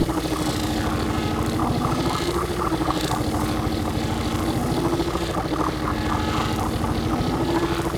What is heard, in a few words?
gas cargo ship loop; Warframe; Corpus Gas City; Ambience